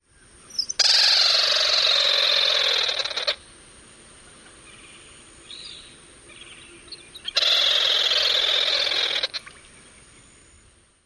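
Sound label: chatter calls, bird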